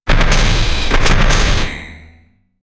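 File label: a bossfight sound when the; sound effect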